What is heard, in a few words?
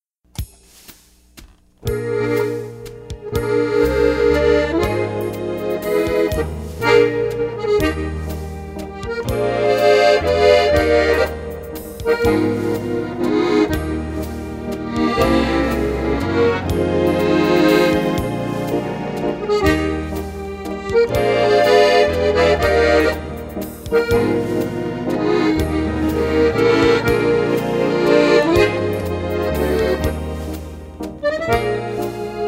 Polka Band; Denver